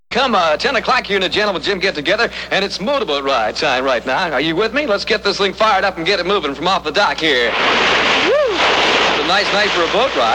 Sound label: summer motorboat ride; 1966